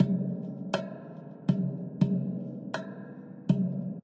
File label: drum; rabbit samurai 2